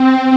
STRINX DN; CMP - Analogon; HP K-STRINX

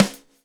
groove; AMG Gota Yashiki Groove Activator

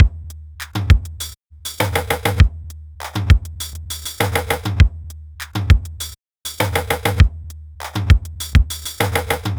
Loops, 707; classic machines; 707mod

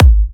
G#, kicks; markus hakala kicks

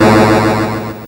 Atari Noise Long, Atari 2600

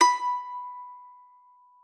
PSALTER H5; Xsample VOL-8

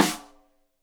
Rim Shot Stereo; Drum; Deeply Sampled Drums; SMDrum

